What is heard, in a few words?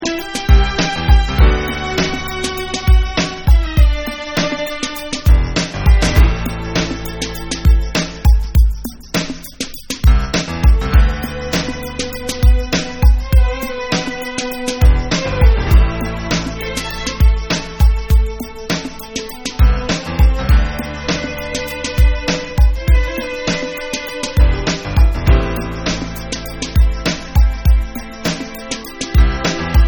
Piano